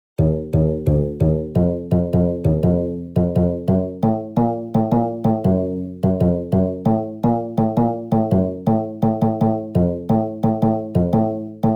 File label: gumbri test